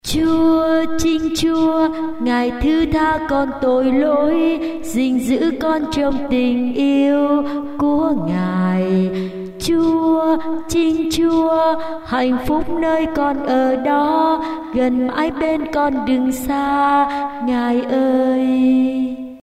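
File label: soprano alto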